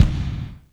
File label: CYBER KIT, DRUMS